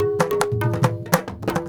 India, Heart of Asia

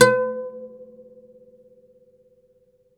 OUD B3 -L; E-MU Producer Series Vol. 7 – Old World Instruments

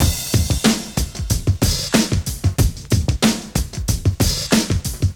DRM CHOP 93; AMG Gota Yashiki Groove Activator

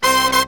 BRASS SAMBA; Best Service ProSamples vol.14; AKAI

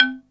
KALIMBA C2; East West; Global Sounds

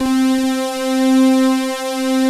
SYNTHETICS, pads; Pro-Rec - Synthsonic; TR PADS

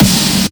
SN Big; Multi Drum Loop 17

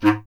B. Clarinet; Miroslav Vitous - Solo Instruments VOL-1; 90 s Sample CDs

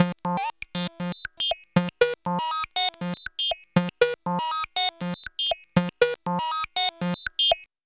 SERGE; AMG - Vince Clarke Lucky Bastard

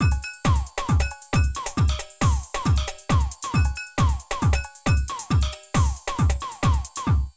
Drum loops, Euro techno

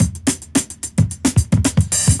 Loop, Roland; Sound & Vision - Gigapack I

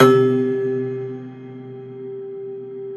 Rubbery Piano, prepared piano; Total Piano

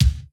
Kick, Yamaha RY20

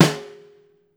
STR SNARE, Brazil Drum Perc; E-MU Producer Series Vol. 7 – Old World Instruments

